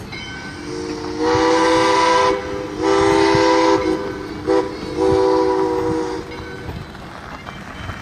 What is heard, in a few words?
train whistle